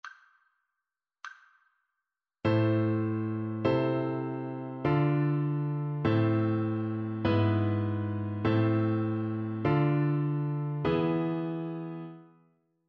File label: harmonic dictation